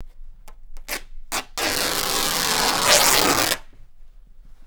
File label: RIP, Canvas Bag Rips